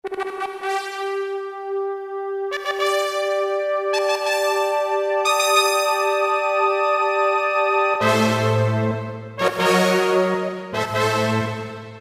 Trumpetters, Roland PN-D50-03

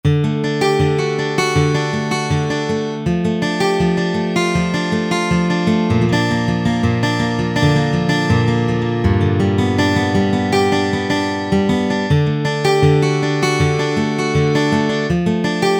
Steel Real

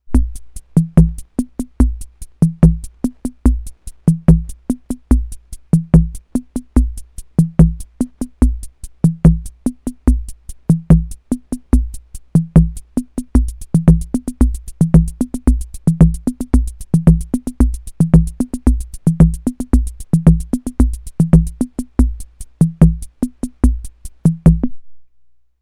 SAMBA, Ace Tone Rhythm Ace FR-2L

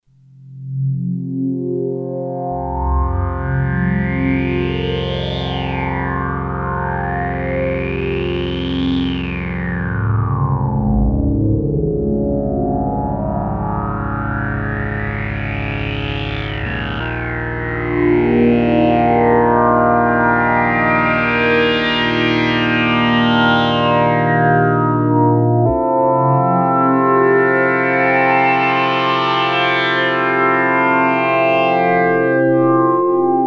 Fourths With Resonance, Prophet-5; Sequential Circuits Prophet-5 (Ver.3); Factory Patch

